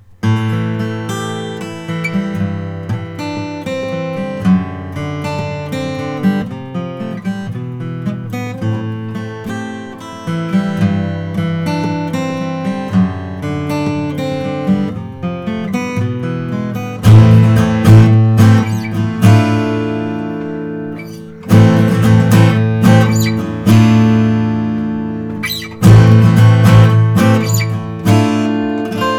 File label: martin om 42 natural